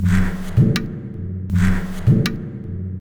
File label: Pure Tabla, 080BPM; Zero-G